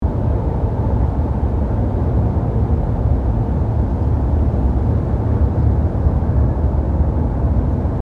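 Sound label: Ambience City Tone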